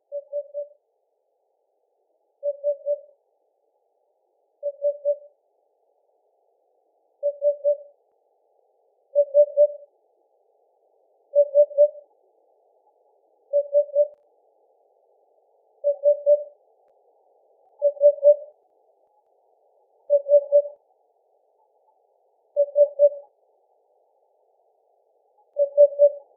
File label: Hoopoe Heavily Filtered